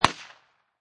Pistol Remington Gp 38 Single Shot Exterior At 45'